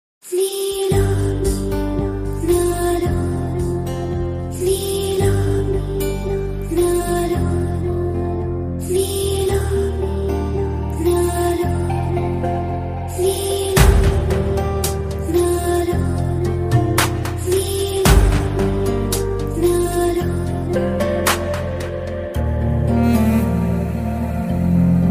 heroine bgm ringtone; rakul preet

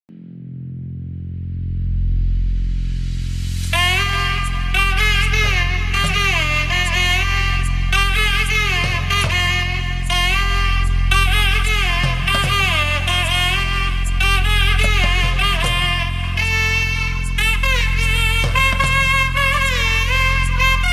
kantara psy trance dj; kantara theme song

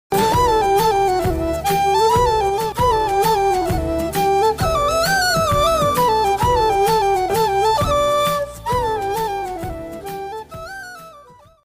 romantic bgm telugu movie songs; akshara; nandita; anudeep

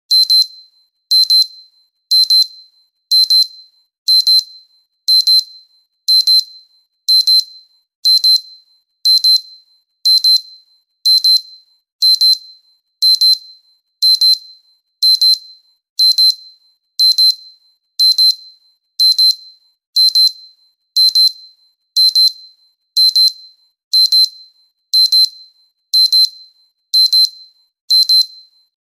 alarm beep ringtone, best alarm tone

